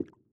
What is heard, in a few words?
drop splash; snakeinabox; double time pitch shift